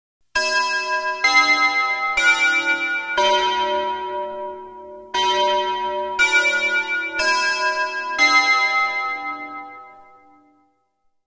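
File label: Church Bells Traditional; bell towers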